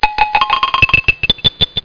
theme

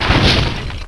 crunch; duke nukem total meltdown

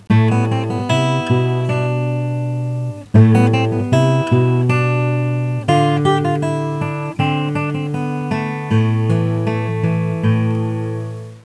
opening music; Spades Deluxe 1.6

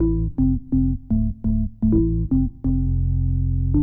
ORGAN HOUSE 125 A; eJay; Keys

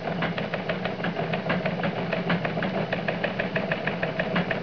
teletype; 12000 Graphics Pack - A Complete Graphics Collection For Your PC

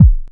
Drums; aloneinthedark4

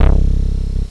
note, electronic sounds; Sound Effects for Multimedia - Volume 1

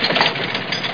winch up; amigamud; rpg

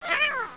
BATH; THEREALM

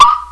Car Alarm Beeper; Bip ⁄ Boing

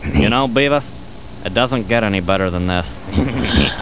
DEATH; butthead; player

